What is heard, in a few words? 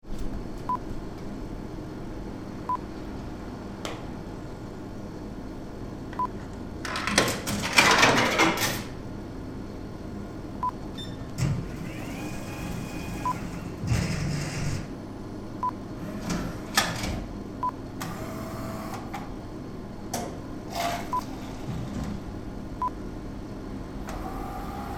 Coffee Vending Machine